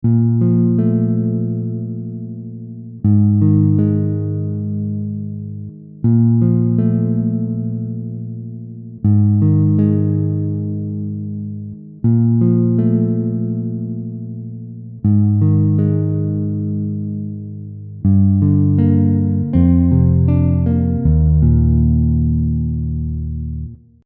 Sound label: instrumental